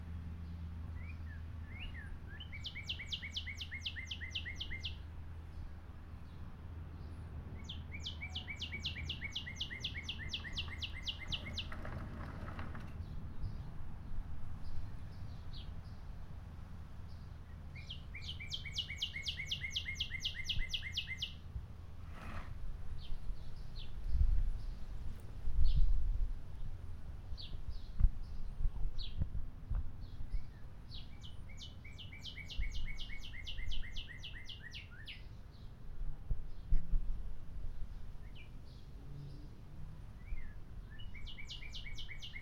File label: Northern Cardinal, Cardinalis cardinalis; cardinals tanagers grosbeaks